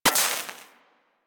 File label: Laser Impact Metal; Surface